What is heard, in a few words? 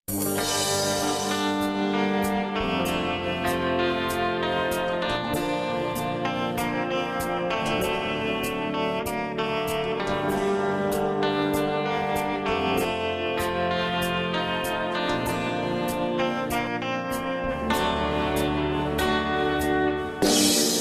slow reverb